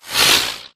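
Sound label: Drone Movement Turn; Titanfall 2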